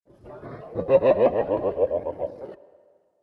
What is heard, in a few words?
evil laugh; taunts; hunters